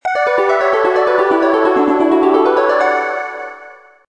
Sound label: jingle